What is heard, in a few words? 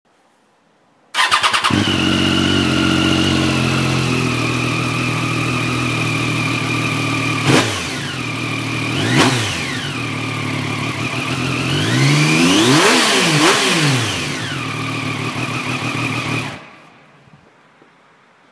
Triumph Street Triple 675R